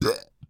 AMB China 3D Amb

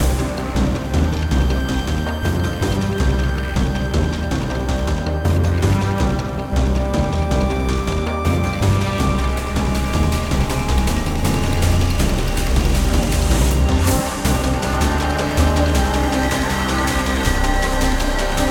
Fight 160 Bpm

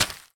Footstep Crayon Paper

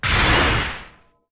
Spell Magic Spirit

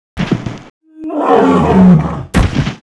Golem Dead

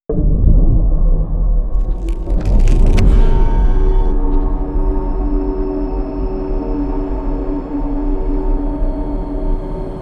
Death; Kamikaze Lassplanes

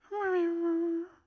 Grub Sad Idle; Grub Sad Idle 02

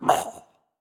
Damage

